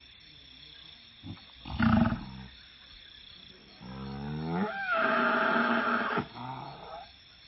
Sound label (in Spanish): toro pitido, corral